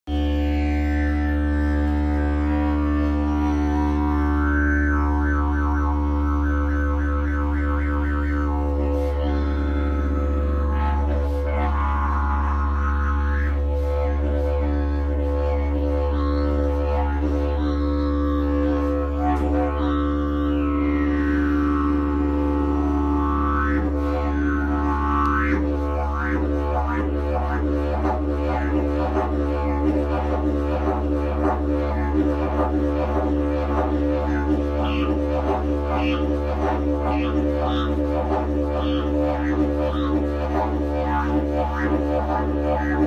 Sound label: didgeridoo; akat mesicni